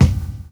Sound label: BD FUNK; E-Lab; X Static Goldmine 2; akai